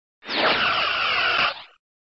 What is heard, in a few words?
Sound SFX, flipper; sttng